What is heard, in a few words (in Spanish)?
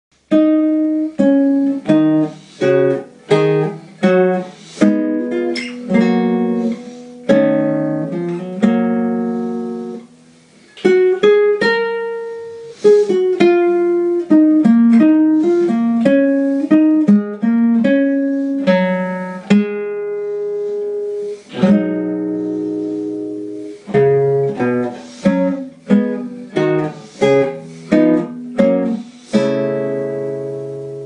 preludio guitar; Manuel Ponce; preludio 13